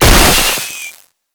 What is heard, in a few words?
Infested Survival Purification Weakpoint Destroy; Warframe; Nineteeen Ninety Nine